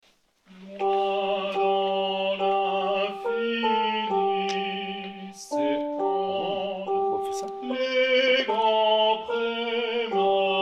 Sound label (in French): soprani; Chant des Marais